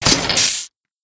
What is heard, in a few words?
teleporter destroyed